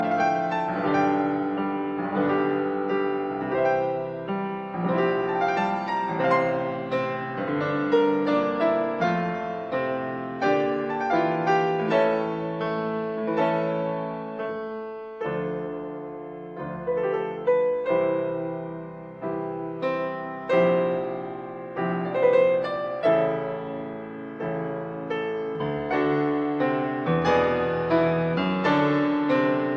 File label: Theme with Variations arr of String Sextet in B flat major, Andante ma moderato